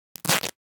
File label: Cloth Split Rip Tear - Quick - Foley Cloth Movement; Hollywood Edge; sound designer's toolkit v2